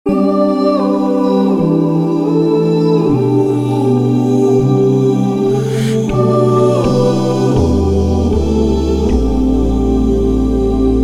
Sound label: RAM MANTRA ACAPPELLA KIRTAN